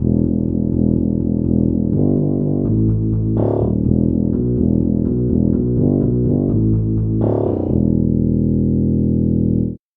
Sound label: tuba bass